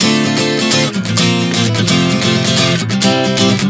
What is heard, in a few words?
Dm7-Bb-F-C 130 bpm, acoustic guitar chord progressions